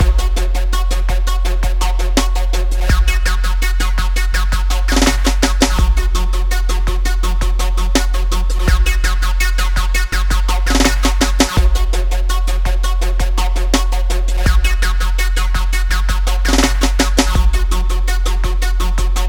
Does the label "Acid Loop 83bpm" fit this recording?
Yes